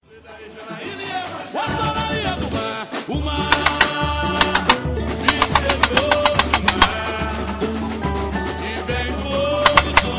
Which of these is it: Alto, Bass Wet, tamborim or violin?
tamborim